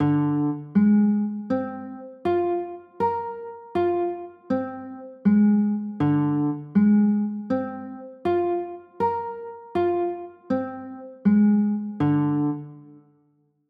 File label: b flat 9, chords